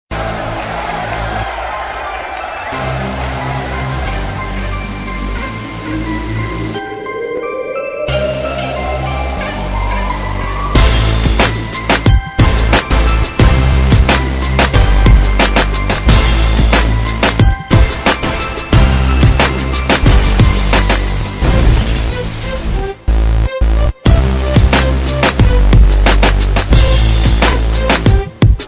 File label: hip hop beat